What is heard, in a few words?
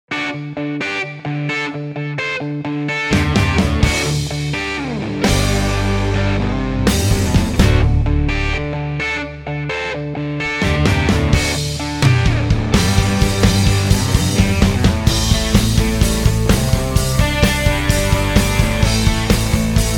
70s FM Rock; Sample Library Tracks